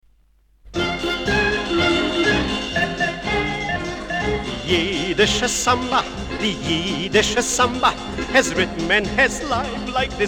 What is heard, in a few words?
yiddish samba